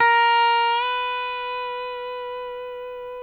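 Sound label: pedal steel bend up A#4, Right; PEDSTL BENDS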